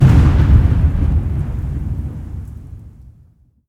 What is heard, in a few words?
Thunder Strike cast